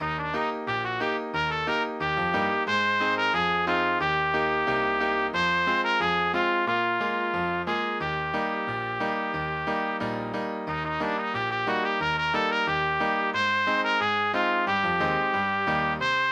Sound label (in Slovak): Polka 2 pre trúbku a klavír